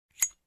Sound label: Steel lighter switch